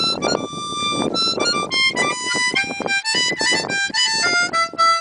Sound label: Harmonica